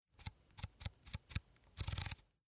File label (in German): Leitz Leica Fotoapparat Blende